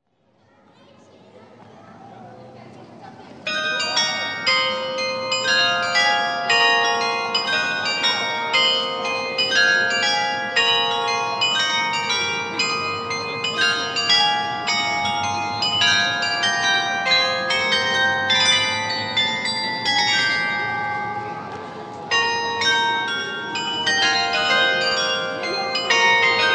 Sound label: carillon; Allerlei 2004-2005